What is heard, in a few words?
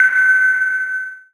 findme alarm, notification